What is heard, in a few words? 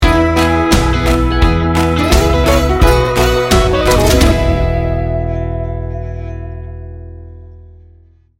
folk electric sting bumper